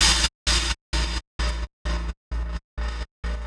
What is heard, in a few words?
Gated Cymbal; musicradar